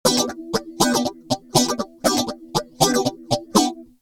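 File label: rhythm guitar, phaser; master phaser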